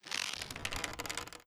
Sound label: pull, weapons